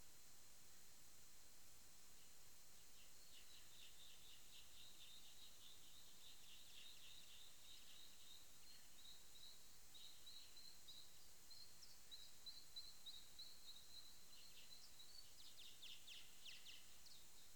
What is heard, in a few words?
Miniopterus schreibersii; MNHN